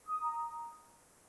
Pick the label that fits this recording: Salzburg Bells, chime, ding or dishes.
ding